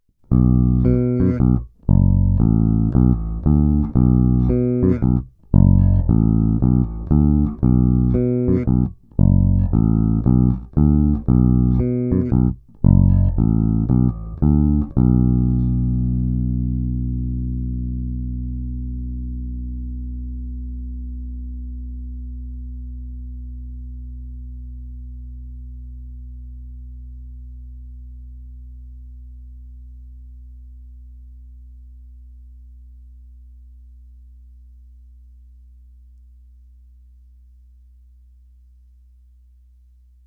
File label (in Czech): Fender Blacktop Precision Bass, Oba snímače HB